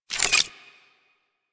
Pistol Post Fire Mech; Sentient; Crp Sent Amalg Pistol

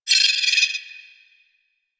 Valley Night Critter; Ambience; Warframe; Quests; The New War

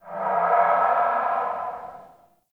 Cave Ocean Animal Very Far; Ambience; Midrath Island

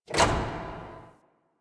Worker Lever Pull; Warframe; Venus Open World; Venus Solaris United

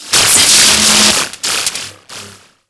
Ocean Electric Surge Large

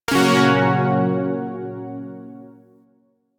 Shawzin Hirajoshi Chord; Zariman Shawzin

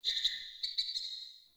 Sparrow Death State Song; Animals

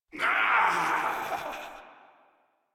Death, Grineer Male; Warframe

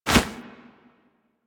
Holster Weapon; Tenno Alt

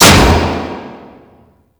Settlement Piston Close; Warframe; Ambience; Grineer Settlement

